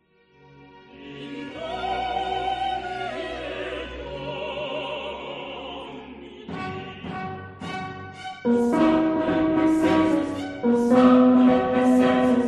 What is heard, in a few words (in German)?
Benedictus Tenor, spatzenmesse